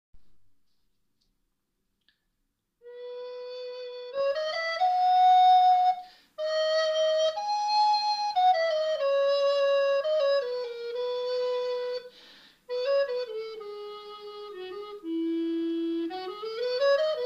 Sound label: Alto 415Hz